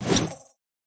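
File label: leprechaun dodge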